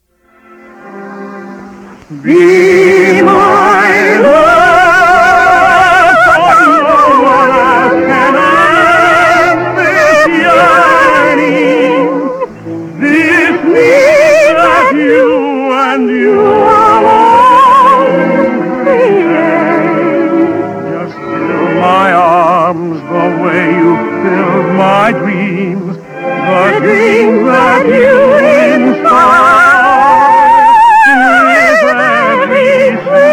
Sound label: Duet